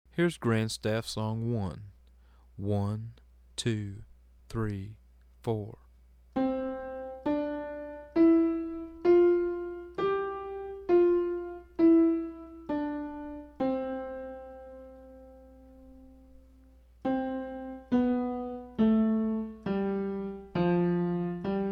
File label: Slow